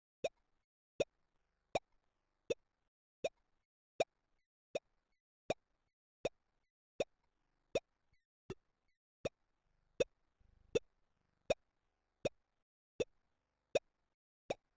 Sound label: collection pops; grunk; New grunk collection SFX